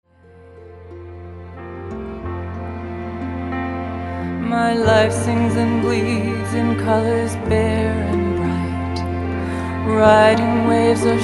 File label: last sigh